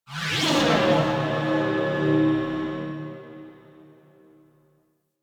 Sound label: Ship Tech Moan Texture Med, Ambience; Warframe; Corpus Ship Remaster